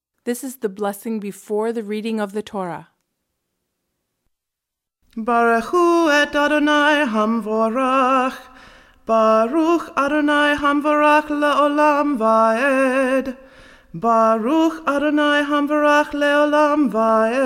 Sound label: Blessing Before Torah Reading; jewish rituals in the synagogue